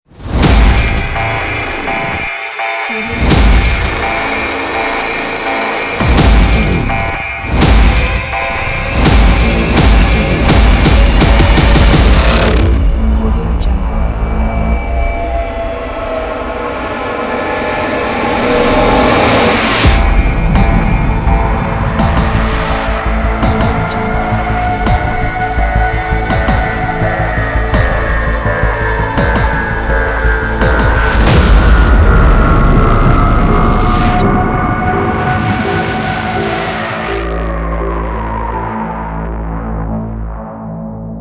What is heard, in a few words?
state of emergency trailer